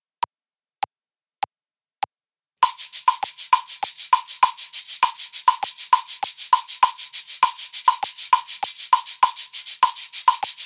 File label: Cabasa Foot pedal; shakers